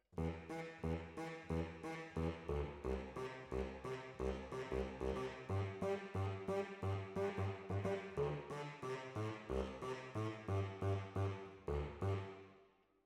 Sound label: Alto, RNR for piano